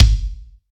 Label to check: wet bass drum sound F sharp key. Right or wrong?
Right